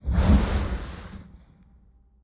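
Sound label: spin start, slot machine; wingsofriches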